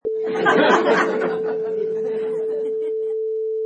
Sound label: Crowd Laughs